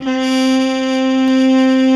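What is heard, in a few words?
mute trumpet; Greytsounds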